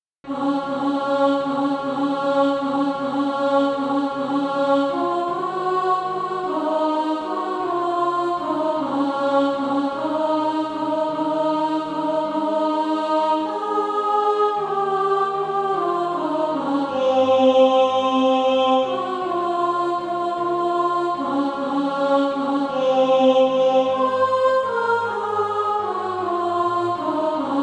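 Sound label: alto, Practise Music; Crown Him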